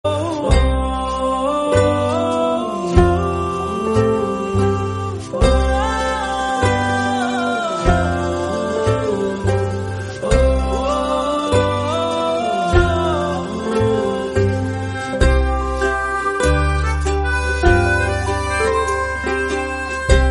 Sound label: love ringtone; aanandhum movie